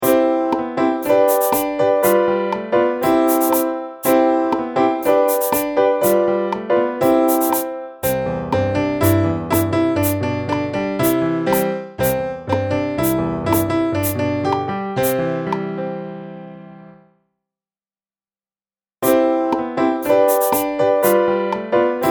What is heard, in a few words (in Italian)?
vieni anche tu base